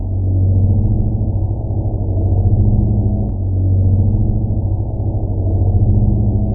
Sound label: fan